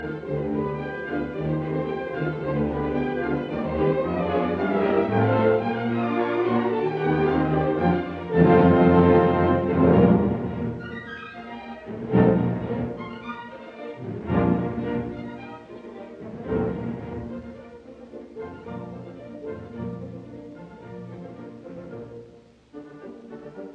Symphony in B flat major Spring Allegro animato e grazioso; Schumann; Op 38; Max Fiedler